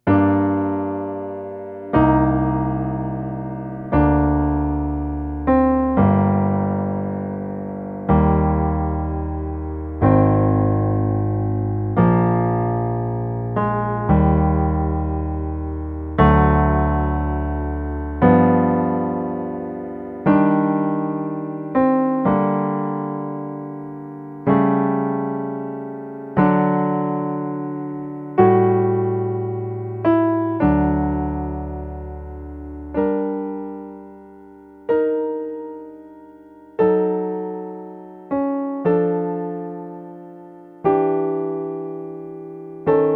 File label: Organ Prelude